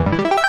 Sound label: drumm spinning; pharaohslotscasino